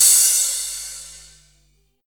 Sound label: crash single hit b key; cymbal drum samples; single hits one shots